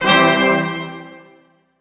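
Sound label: music sting negative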